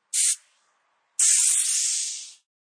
Compressed Air Quick, Compressed air from air compressor while filling car tire at gas station; Air Pumps